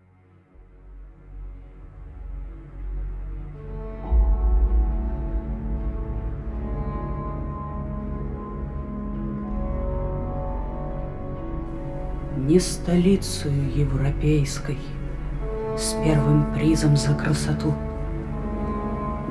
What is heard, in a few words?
Ballade in F Minor